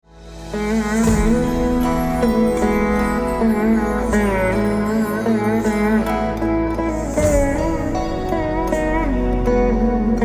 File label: Bhajan ringtones; Sri Sathya Sai